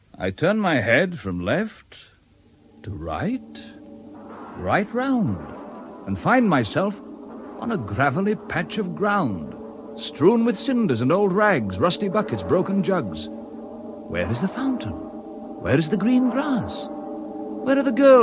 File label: Percussive Phantoms; The Bagman